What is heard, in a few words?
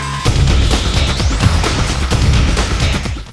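snow storm; arctic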